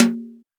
reverb tom drum a sharp key

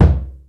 strong bottom end kick drum c key

